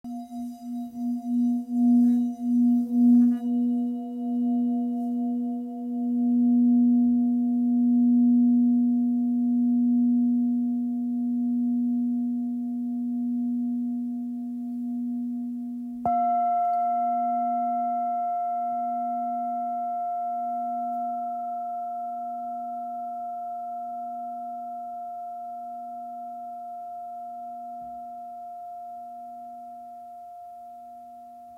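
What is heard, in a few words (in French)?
Bol full moon si, bol chantant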